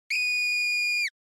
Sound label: Horror Scream High Dry